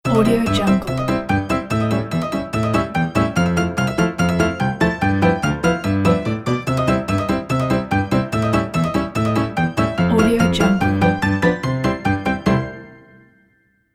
retro funny piano logo

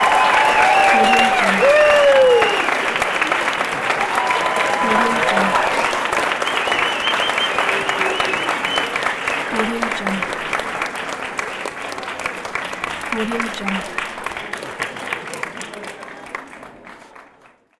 heavy audience applause

